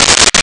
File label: Guiro; Nintendo; July 2020 Gigaleak